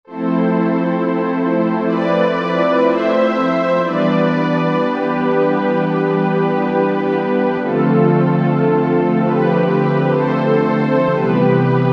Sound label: Warm Strings, Korg Wavestation A-D